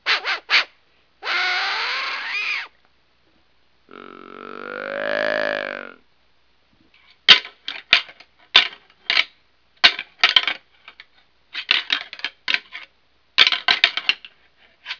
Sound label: rattle, deer rattling; practical bowhunter